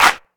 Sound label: clap single hit f key